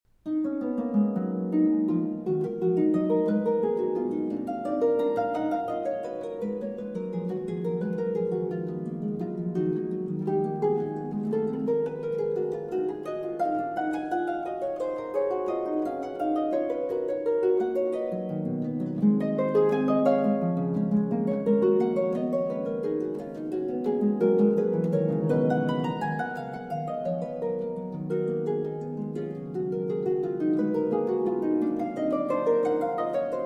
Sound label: Lute Suite in E Minor VI. Gigue; BWV 996; J.S. Bach